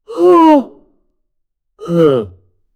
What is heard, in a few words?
male shocked expression; expressions